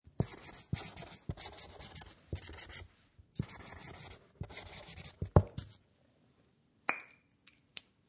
Writing With Permanent Marker, writing on paper with a permanent marker pen